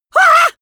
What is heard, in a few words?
shock shout male; vocals; comedy-cartoon; soundpool